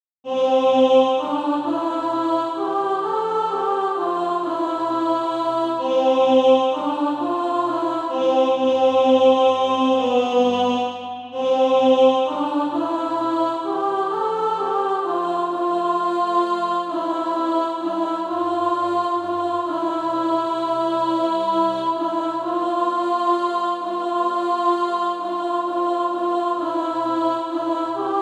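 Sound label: alto, SATB Practise Music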